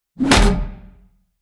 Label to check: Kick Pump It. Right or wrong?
Wrong